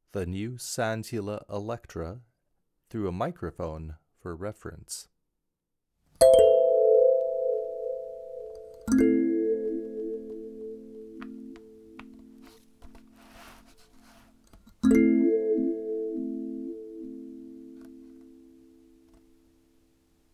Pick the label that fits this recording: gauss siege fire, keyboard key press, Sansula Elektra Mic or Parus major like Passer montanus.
Sansula Elektra Mic